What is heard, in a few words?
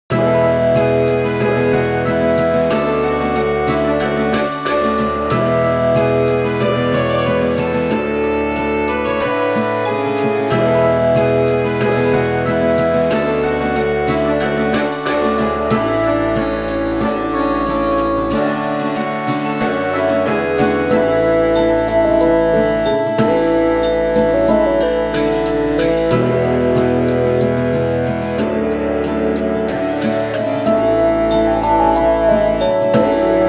Tavern